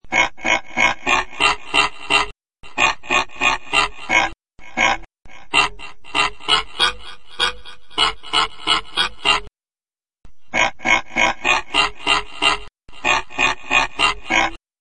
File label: frog